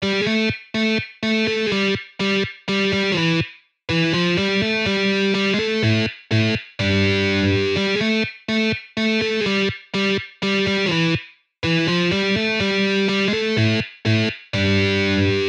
Metal guitar riffs

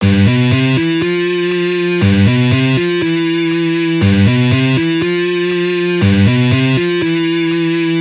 Easy Guitar Riffs

